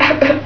"EHH"; 999wavs

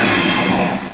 GUN

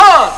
HUUUUH

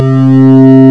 whirr; HIGH TECH

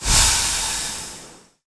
GAS; KRONDOR

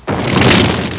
FIRE; doomap2

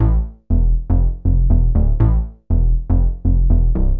BASS, DANCE; MAGIX

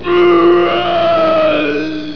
URRRAAAE

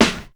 HEAVY SN; BEAT2000

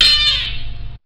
GTR SLIDE; BEAT2000

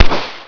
POPP

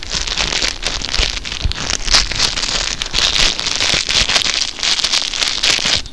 CRINKLE

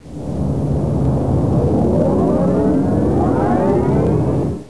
restart

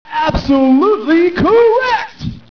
hurray

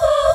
AGONY VOX; VOCAL; cubase

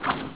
TEE SWING; EFFECTS

